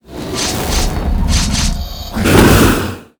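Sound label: boulder crab spawn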